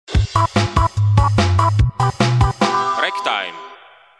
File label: ska time, jingle